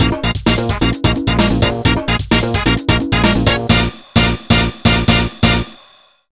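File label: BGM Dance battle; Irodzuki Tingle no Koi no Balloon Trip; NDS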